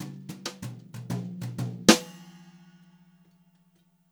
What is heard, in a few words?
fill, Brushed Drum Loops jazzy 126; USB Soundscan vol.39; AKAI